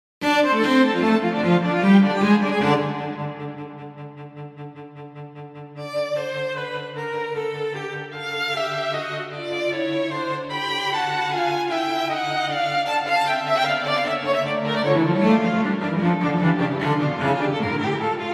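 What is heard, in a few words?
String quartet performance piece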